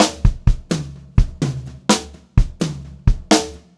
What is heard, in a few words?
126 TOM, Pop-Rock Drumloops; USB Soundscan vol.37; AKAI